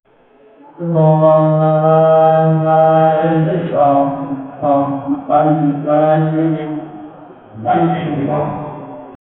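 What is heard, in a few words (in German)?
bedrohende Beschwörungsfor, ritual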